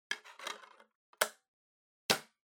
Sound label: Portable CD Put In Close